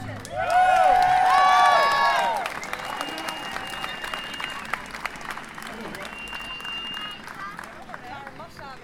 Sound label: Audience Clapyell Outdoor